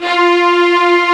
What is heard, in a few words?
STR STRING, Orchestral Strings